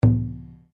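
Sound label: Drum Taiko piano, Japanese Taiko Drum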